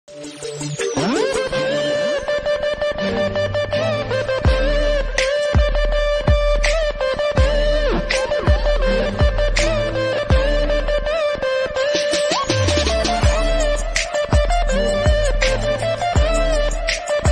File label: indian music bgm